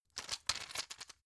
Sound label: plastic blister handle